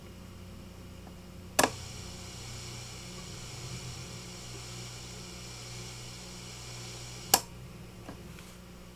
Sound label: Guild G600 Aspen Chorus Hiss; amplifiers